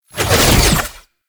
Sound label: Pistol Reload; Warframe; Weapons; Tenno; Temple Signature Pistol